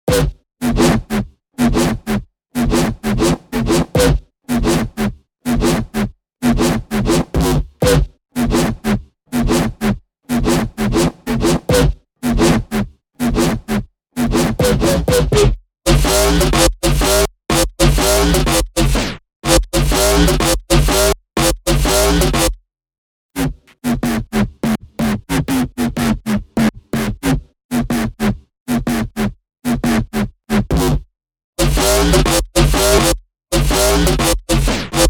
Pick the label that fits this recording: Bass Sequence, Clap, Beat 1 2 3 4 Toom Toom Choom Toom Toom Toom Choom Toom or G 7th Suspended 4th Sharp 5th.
Bass Sequence